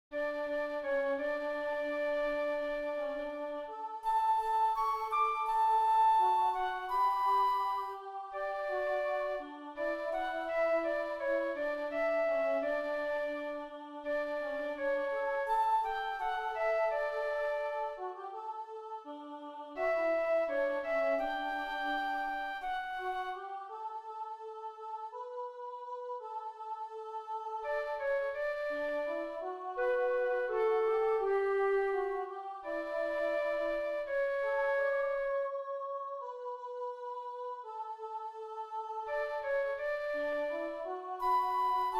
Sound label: Celeste Jerusalem FLUTE